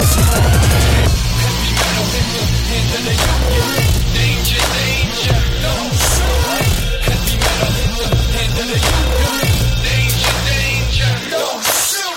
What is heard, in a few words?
dubstep